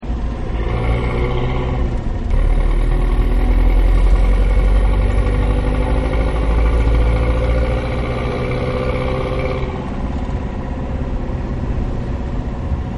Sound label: Traffic Industrial; Traffic And Cars; Backgrounds